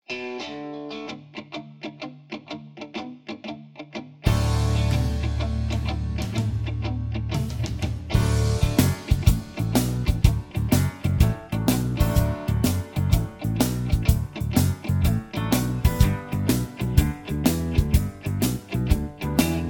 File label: D No Backing Vocals, backing tracks